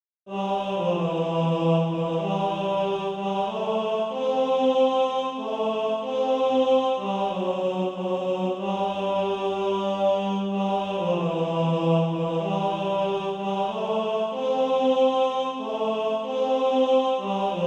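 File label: tenor, SATB Practice Music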